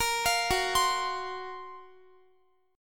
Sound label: F sharp Major Sharp 11th, Guitar; F#M#11 arpeggiated; x,x,22,22,18,20; 4F,4A,5C,5G,5C,6E; chord shape